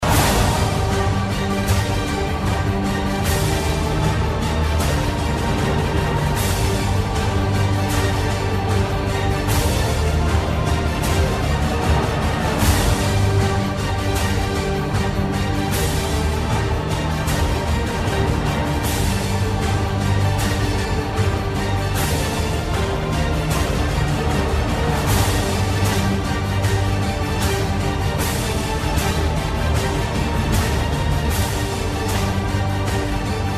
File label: connecting; cstrike; zombie plague